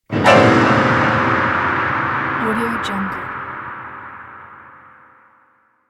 horror scene piano hit

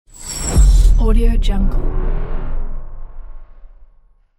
alien technology

